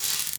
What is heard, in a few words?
electric wire spark